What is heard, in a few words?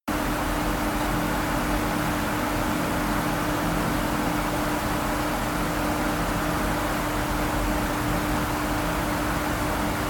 Large pedestal fan